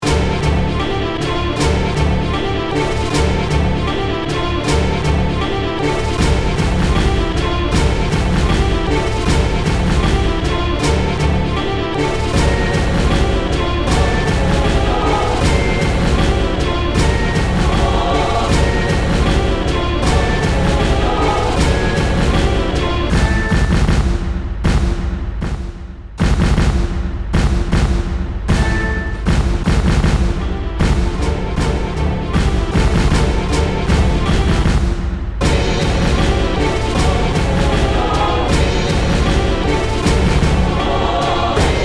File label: Music Loop; battle bears 1